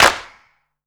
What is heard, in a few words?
ambient clap single hit g sharp key; percussion; single hits one shots